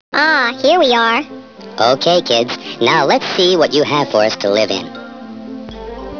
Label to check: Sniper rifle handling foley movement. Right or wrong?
Wrong